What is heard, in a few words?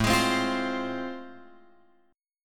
A Minor Major 7th Sharp 5th; AmM7#5 Chord {5 X 6 5 6 8} strummed